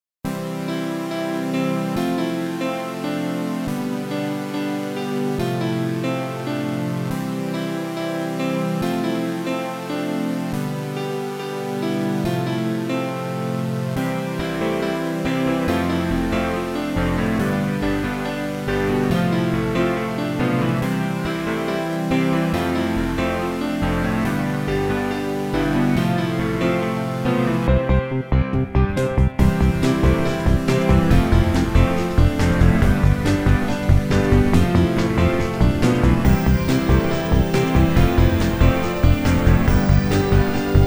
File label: Piano Hammering